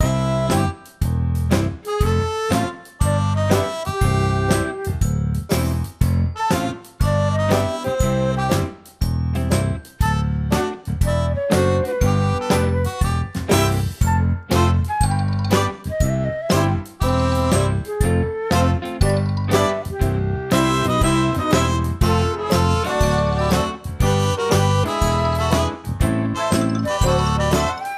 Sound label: strolling music